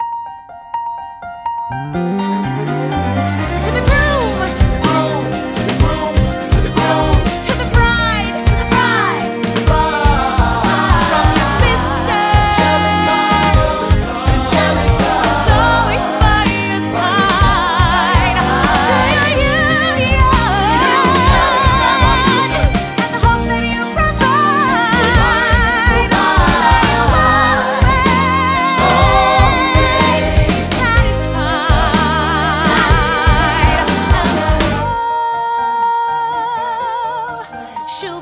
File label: vocal